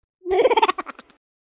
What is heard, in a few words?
Laugh; hedgewars; Default es